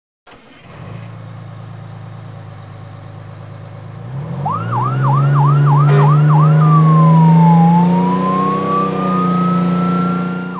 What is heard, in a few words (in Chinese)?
警车